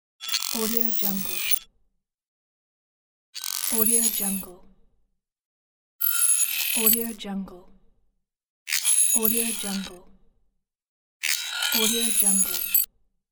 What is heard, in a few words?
launching the interface